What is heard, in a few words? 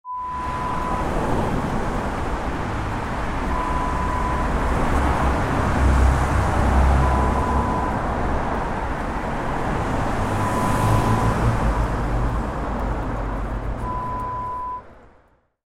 traffic under bridge sound